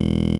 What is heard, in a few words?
perc voice, Roland MC-909